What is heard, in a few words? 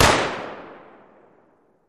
Gun AR-15 Single Shot Fire